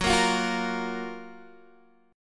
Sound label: F sharp Major 7th, Guitar, DADGAD